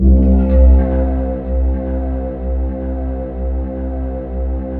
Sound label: GOA C 1, Korg; Back In Time Records; Korg Universe VOL-1